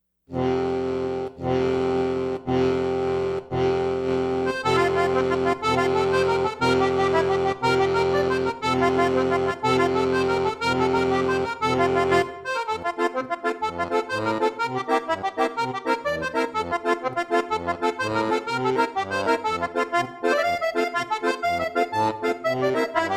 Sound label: Celtic Irish, Accordion